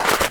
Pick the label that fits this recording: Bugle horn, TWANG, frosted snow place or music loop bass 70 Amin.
frosted snow place